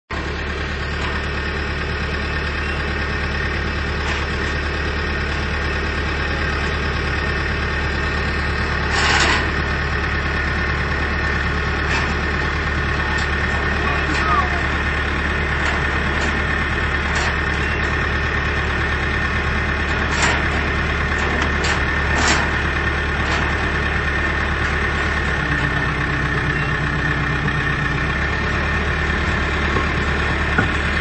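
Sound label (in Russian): звук бульдозера на строительной площадке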